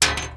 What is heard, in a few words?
metal; Mafie 1